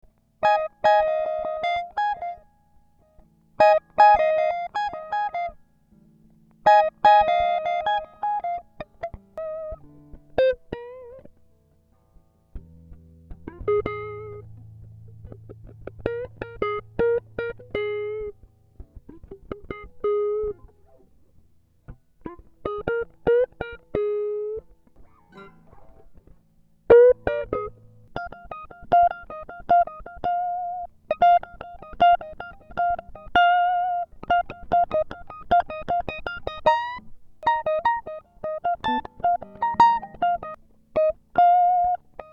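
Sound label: F 77 Midwinter guitar impro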